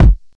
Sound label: studio bass drum single shot g sharp key, Royality free bass drum single hit tuned to the G#